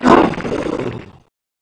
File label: dead, skeleton king